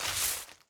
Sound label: environment zone thorny bush step